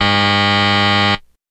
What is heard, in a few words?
Buzzer Elect Game S, electronics